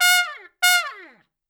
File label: Trumpet Noise; Sonic Foundry (Sony Creative Software); Jazz Solos and Sections